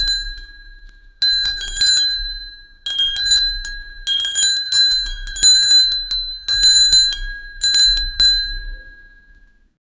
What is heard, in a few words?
temple bell; musical instrument